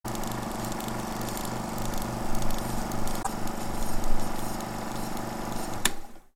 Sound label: camera film sound fx